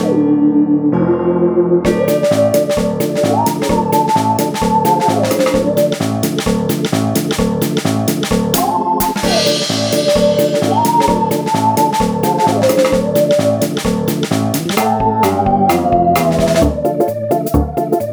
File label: Undead Loop With Intro